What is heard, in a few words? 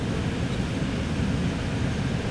old italian diesel train interior moving loop